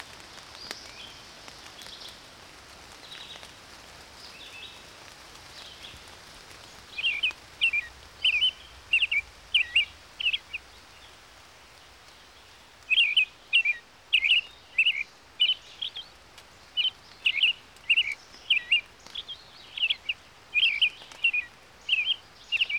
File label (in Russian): malinovka